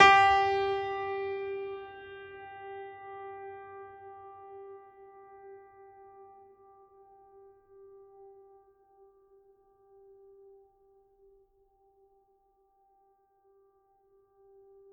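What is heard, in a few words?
Classical Piano G2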